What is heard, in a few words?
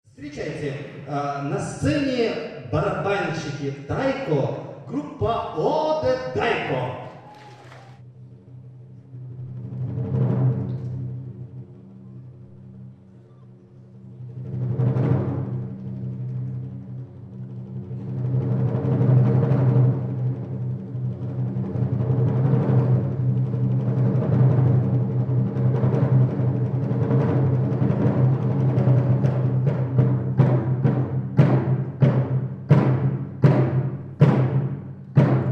taiko